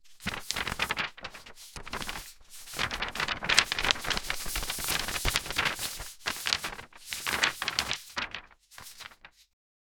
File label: shuffling papers